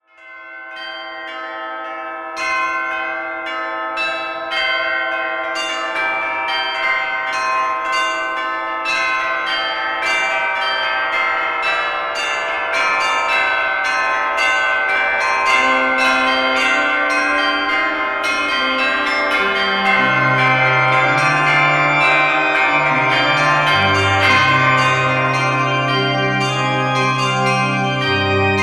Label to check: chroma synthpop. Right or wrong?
Wrong